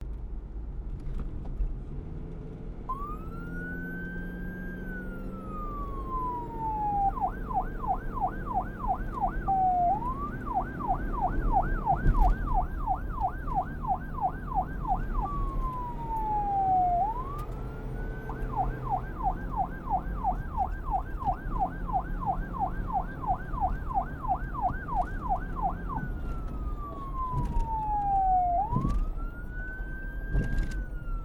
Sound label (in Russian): policejskaya sirena zvuk iz mashini